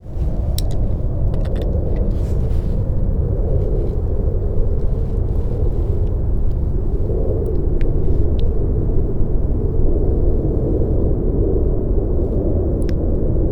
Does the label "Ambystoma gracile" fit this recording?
Yes